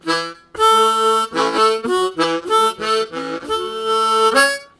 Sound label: Octaved, Harmonica Riffs; Zero G - Harmonica